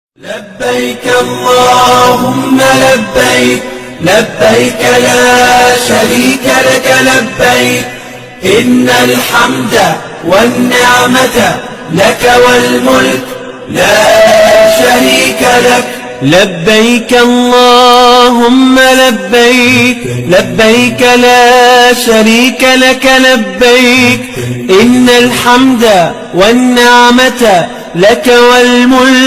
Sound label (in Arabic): لبيك اللهم لبيك تلبية الحجاج; The holy quran